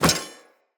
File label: Spear Catch; Weapons; Player